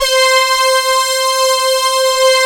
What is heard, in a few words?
BRAS, Moog Synths